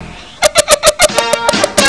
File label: giggling